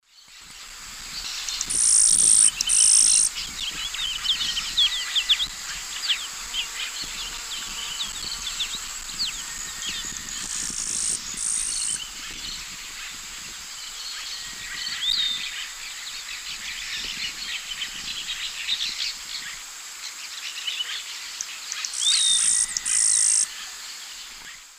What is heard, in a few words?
Ammodramus aurifrons, 2 song types; S. America; Eastern Lowlands